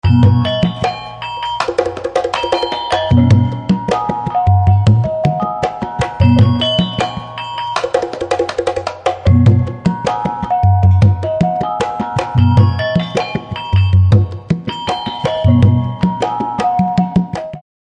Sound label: pamayang drums, sunda